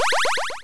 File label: start; raylib; wave collector; GGJ17 game